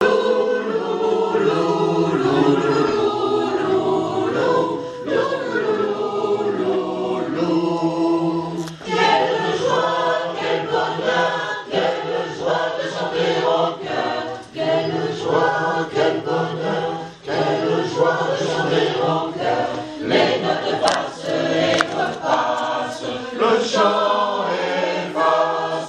sonnerie entier avec intro tous

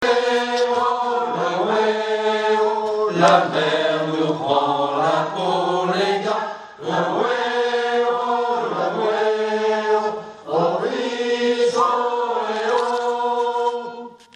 refrain voix de tous; Haul Away

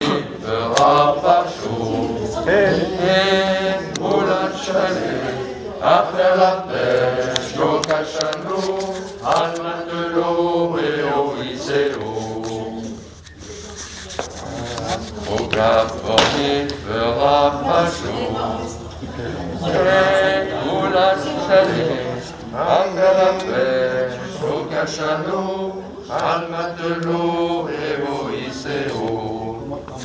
valparaiso couplet hommes

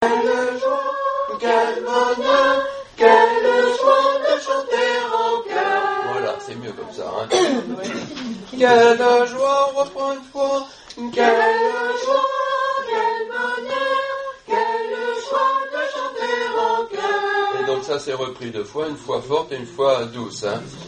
sonnerie

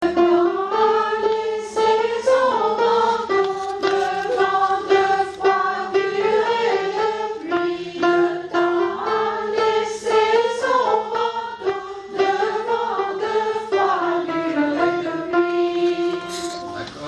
rondeau refrain

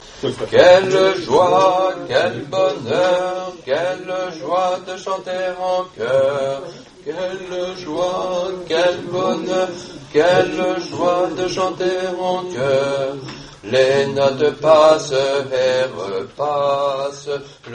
sonnerie entier

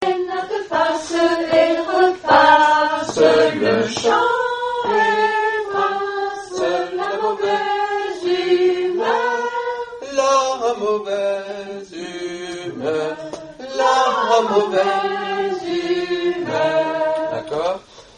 sonnerie

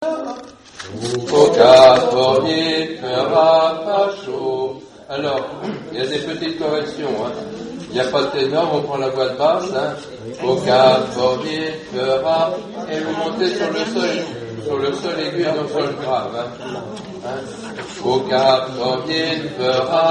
couplet hommes; Valparaiso

